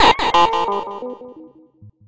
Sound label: harsh echo